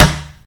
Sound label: Rubber Flesh, collision sounds; opensim; CollisionSoundsAssetSet